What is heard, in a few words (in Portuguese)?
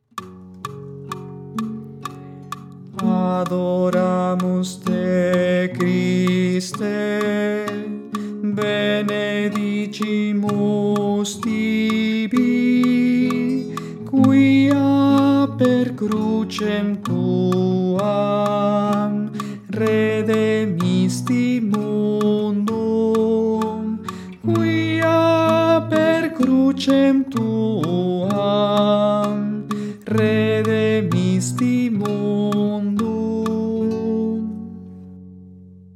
Tenor; Adoramus te Christe